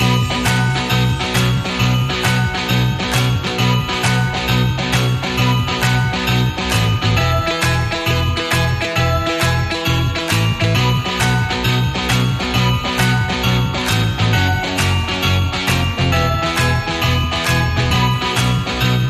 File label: Rock n Roll Christmas Loop Simple Clap and Bells